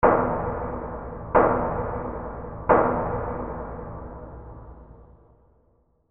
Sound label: knocking